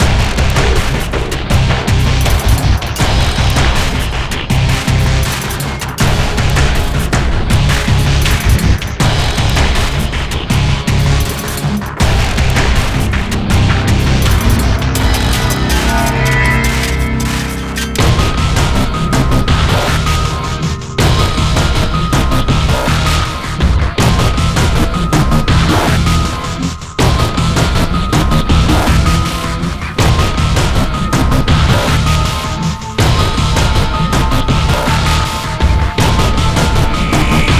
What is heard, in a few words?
Musik Alien Fight